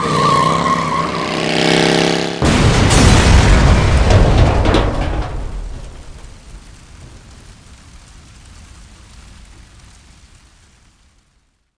Leaves Explode; bully scholarship edition; Harley; NIS

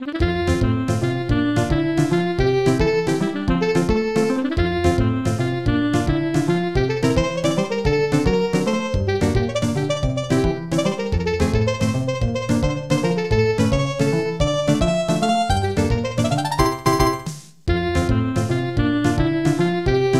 Stable; Thoroughbred Breeder; SNES

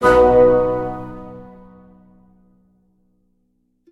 Archer Steps; King's Quest Chapter 1 A Knight to Remember

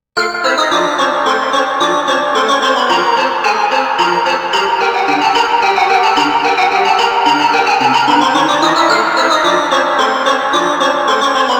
Organ Circus Theme Verbed; Rage; Westar

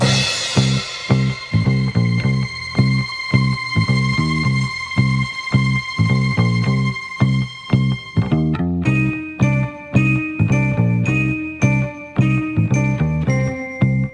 Street Fight Large Low Boxing; bully scholarship edition

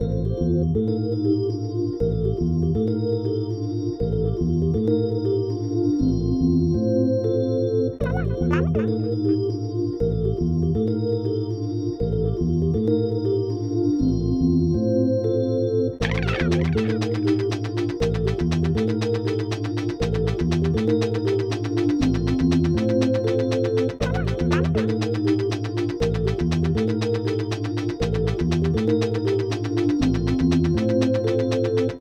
ambient joy; Sabotain Break the Rules

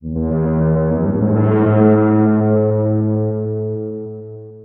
Horn; Real Warfare 2 Northern Crusades

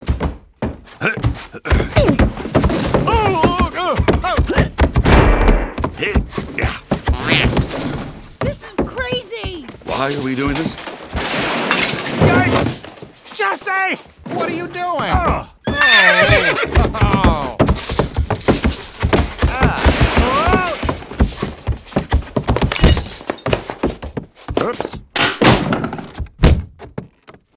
Toy sounds; Toy Story 3

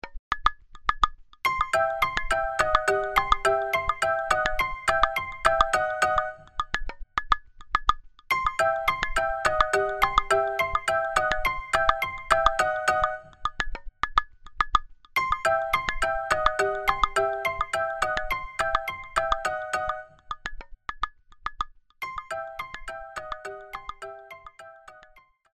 Board Game Stock Market (Down); animal crossing amiibo festival

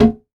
bathroom toilet seat close; a dump in the dark

